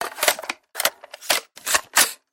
hk416 reload, guns

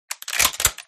spas12 cycle, guns

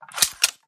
qbb97 switch, guns

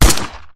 vector, guns

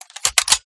vector switch

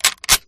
garand switch, guns

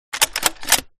awc cycle, guns

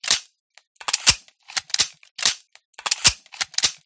deagle reload, guns

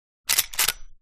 model94 cycle, guns

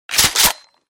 m870 cycle, guns

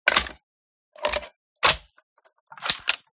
m4a1 reload, guns

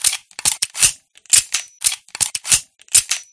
m93r reload, guns

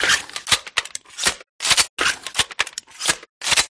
glock reload, guns

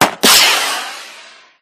flare gun

